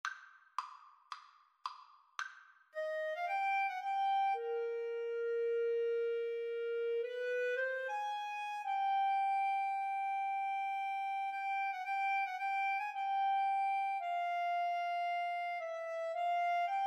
Clarinet-Saxophone Duet

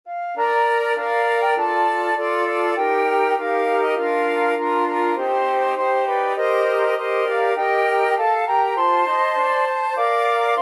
flute quartet

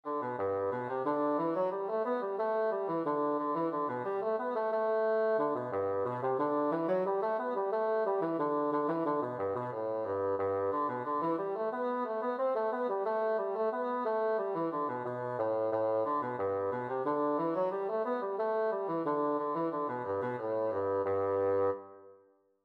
air, bassoon

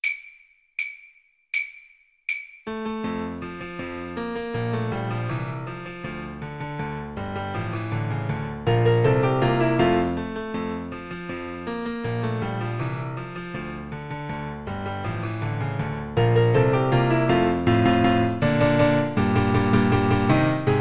DB BASS, Double Bass

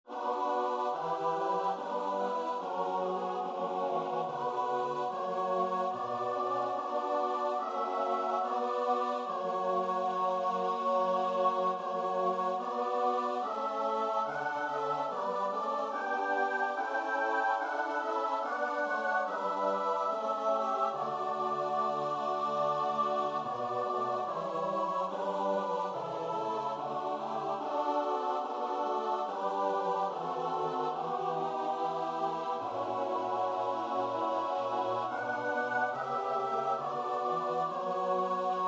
st matthew passion, choir; bach

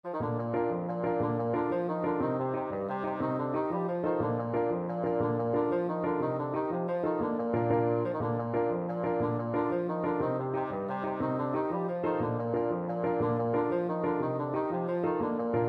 irish jig medley, bassoon

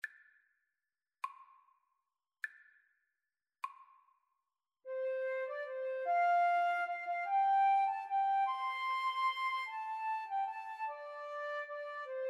Flute duet

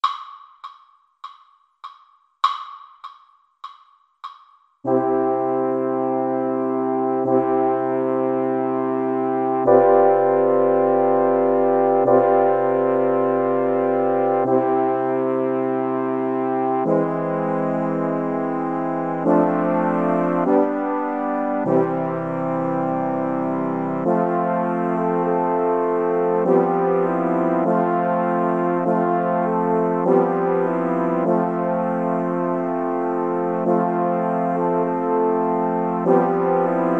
dvorak largo piano

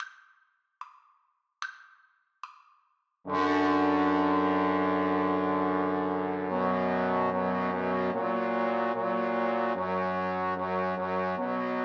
Trombone Trio

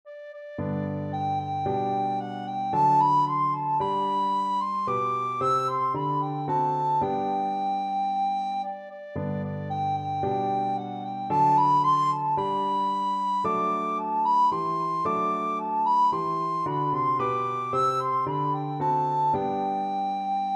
recorder

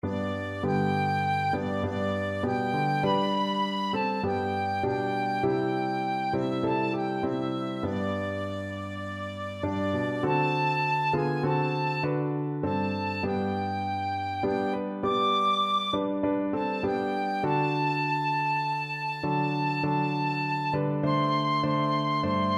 tin whistle

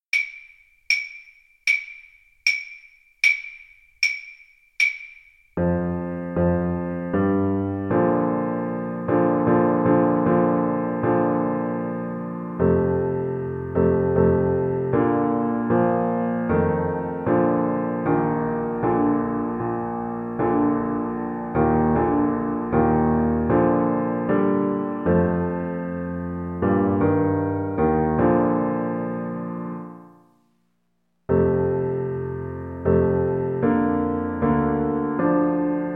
horn, French Horn

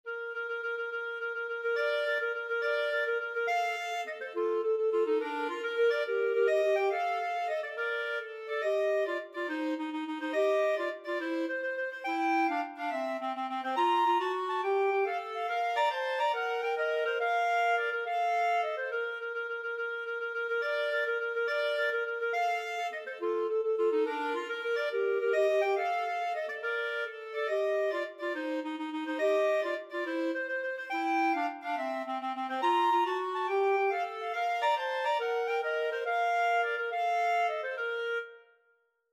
clarinet duet; mendelssohn

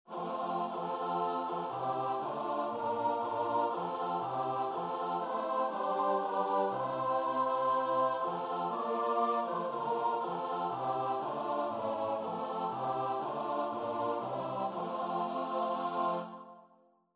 While Shepherds, choir

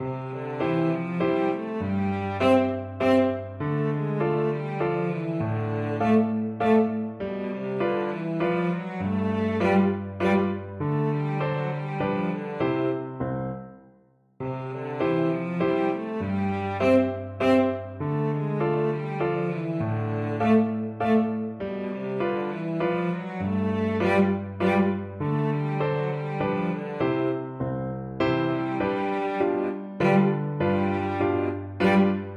Waltz in A, cello

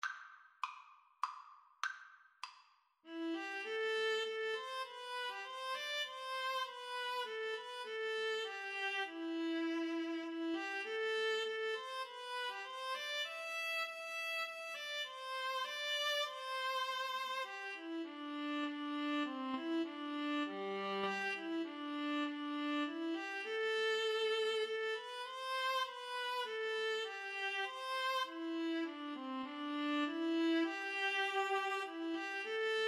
Viola duet

